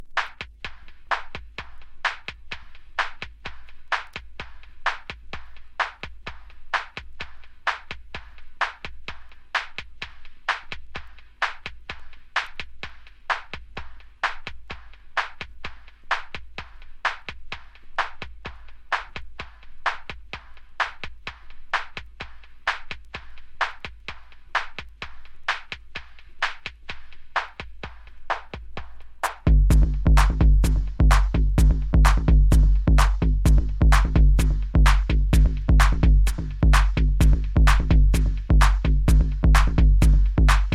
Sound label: Vinyl Rip